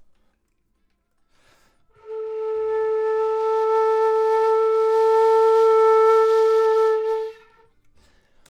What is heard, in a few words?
flute